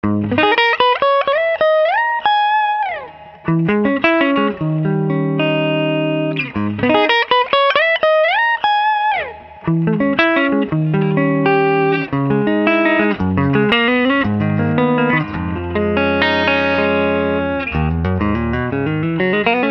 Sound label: Fuchs Tripledrive Supreme